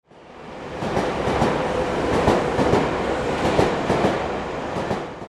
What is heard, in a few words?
train